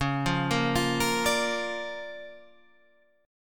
Bb Major Db, Bb/Db Chord; arpeggiated; JGuitar

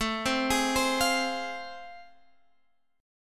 A Minor Major 7th Sharp 5th, Guitar Standard; arpeggiated; AmM7#5; x,12,10,13,13,13; JGuitar

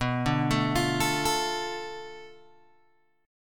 B Minor 7th Flat 5th B, Guitar Open G

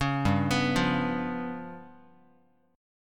G 7th Flat 5th, Guitar; G7b5 arpeggiated

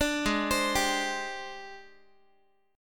G sharp Major 7th Flat 5th Guitar; G#M7b5 Chord

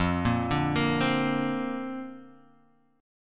Bb Major Add 9th, F

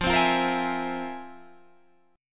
F Minor Major 11th, Guitar, Drop D

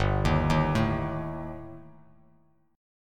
G Suspended 2nd Sharp 5th G down Bass Standard; Gsus2#5 arpeggiated; JGuitar

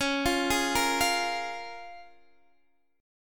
C sharp 6th C sharp down Guitar DADGAD

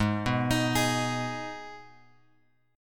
G sharp Minor G sharp, chord; G#m Chord {4 2 X X 4 4} arpeggiated

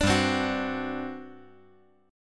G Major 7th Suspended 4th, G, down

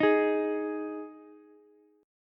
G sharp 5th G sharp Ukulele; strummed; JGuitar

